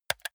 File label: console controller r1 press fast hard